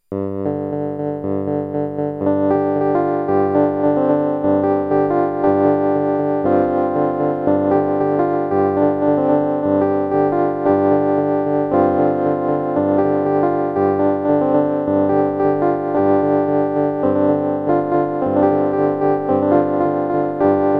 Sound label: piano